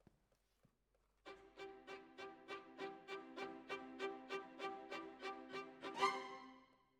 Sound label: Violins, jazz for piano